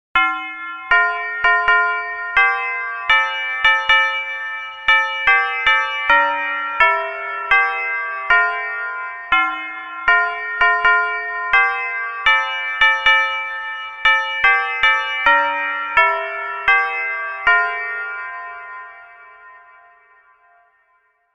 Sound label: christmas ringtone instrumental